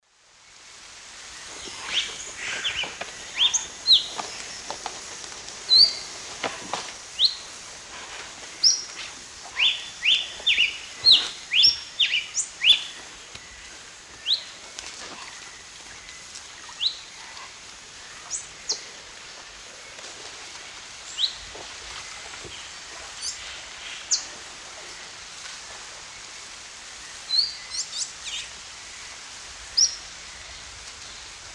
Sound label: Ramphocelus nigrogularis, song and calls; S. America; Eastern Lowlands